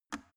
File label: plastic water boiler turn switch on